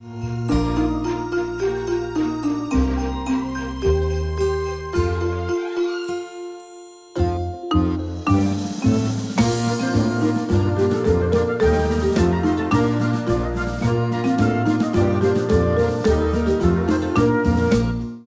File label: instr